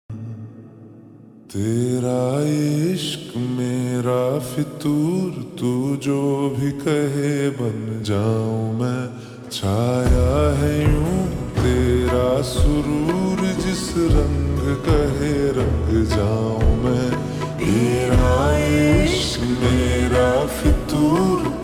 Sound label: Slowed Reverb